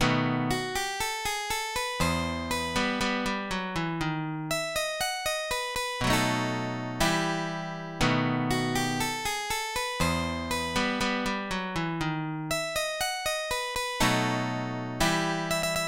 Tango; J Rodriguez

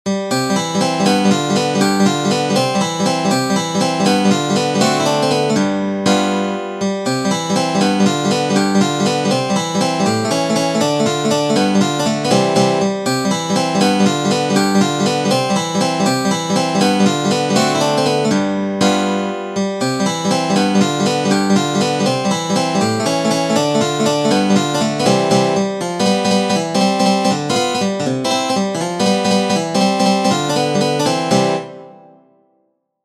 Mazurka, guitar; Arling Shaeffer

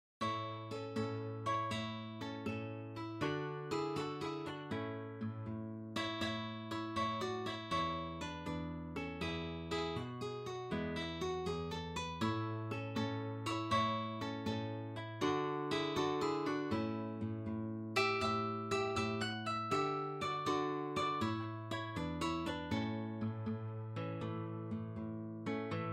waltz, guitar

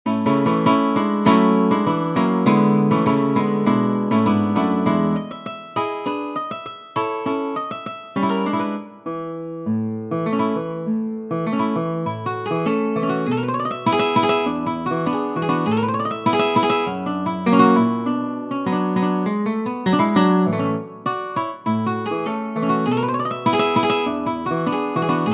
Barcarolle, guitar

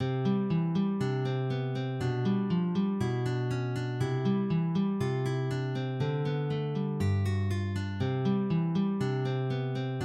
Andantino, guitar; etude contenant 8 exercices

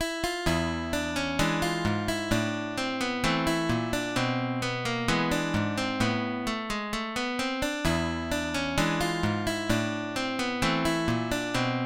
tango, guitar; Canaro

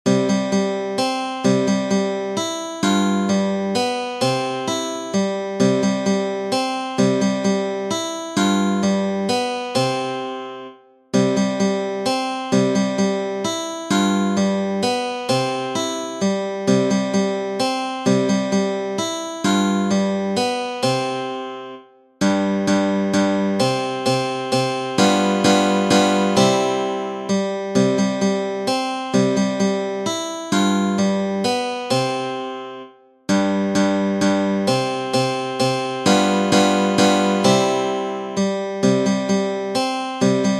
Valse, guitar

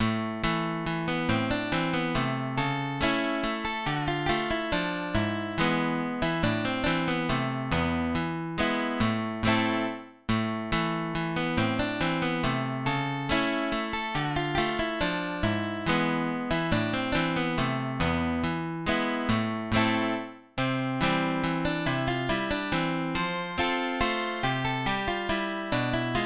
Spanish Mazurka

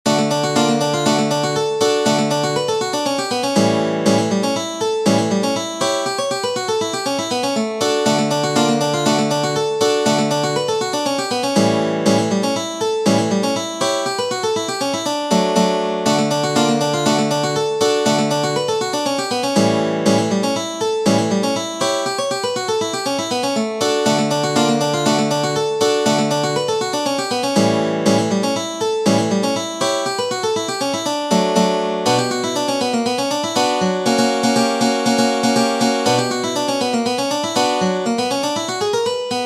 Polka, guitar; Derby Polka

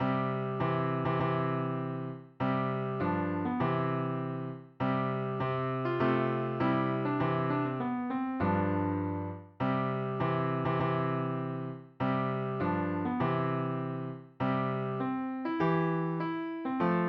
guitar; bridal chorus

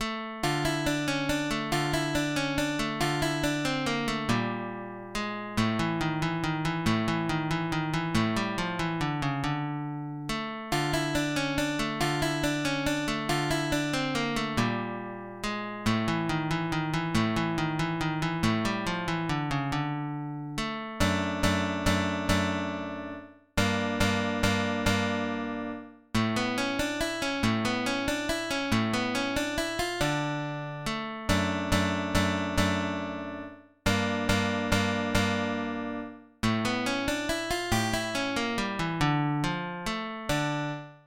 Etude in D minor, guitar

